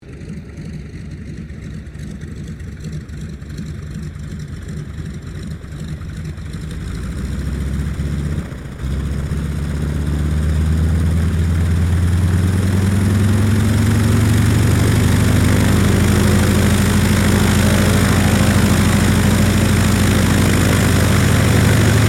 Aircraft CAC CA-25 Winjeel shutdown, WW2 era Australian training aircraft; Airplanes